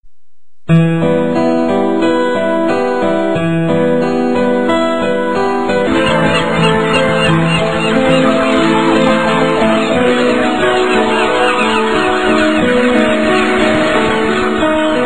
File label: Arabic Tone